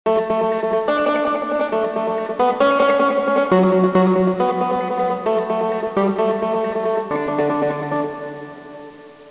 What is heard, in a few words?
Anthem